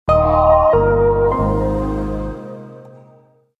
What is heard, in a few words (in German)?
Audio Logo Generisch neutral; ARD Das Erste